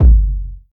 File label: reverb kick g key, Royality free kick sample tuned to the G note. Loudest frequency: 70Hz